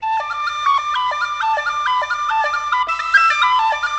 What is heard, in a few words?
tin w solo